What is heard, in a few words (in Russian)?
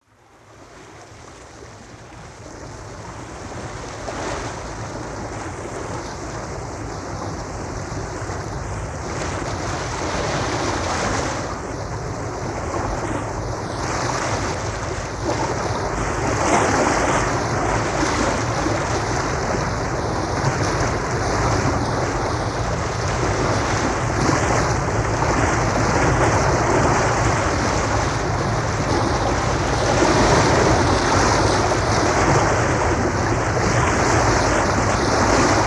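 судно в плавании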